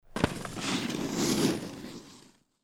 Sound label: snowboard passing quickly